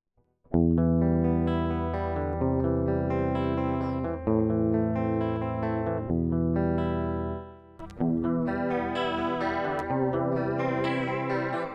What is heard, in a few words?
Chorus